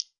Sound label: ultimate jaw harp